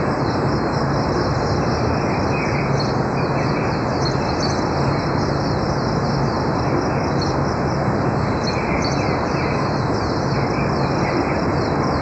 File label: Songbirds